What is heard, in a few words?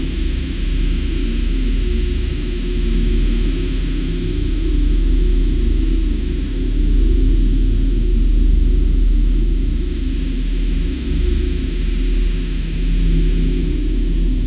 sci fi ambient with backg, bass pulse